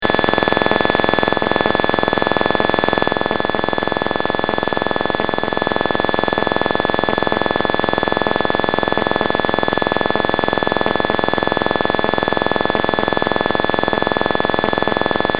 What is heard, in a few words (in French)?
telephonie GSM 2G